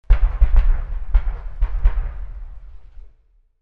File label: Support Flak Activate